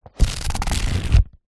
household book paperback pages flick through

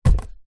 footstep barefoot single wooden step

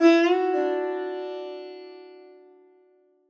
Action Ethnic plugged Indian strings; sound ex machina

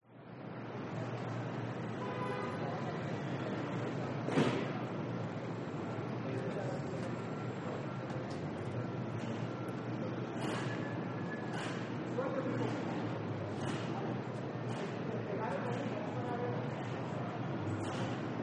New York City Traffic on Cobble Stone

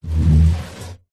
wooden box crate lid slide open fast

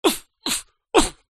human fight karate vocalisation male

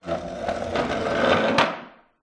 pub wooden chair scrape tile floor; field reports

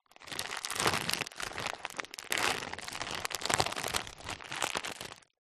plastic thick packaging handling; zapsplat; foley

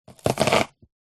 food chop onion slow single slice with knife

